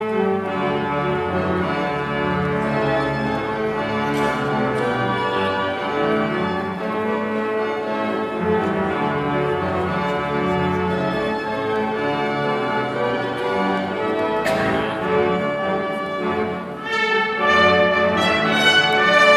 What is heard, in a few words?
Concert; Chateauvillain 2016